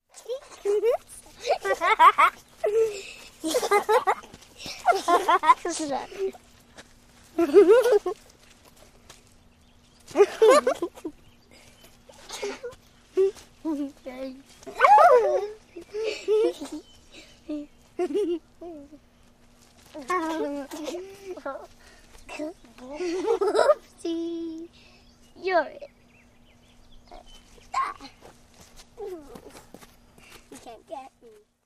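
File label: Two Small Girls Tickle, kids play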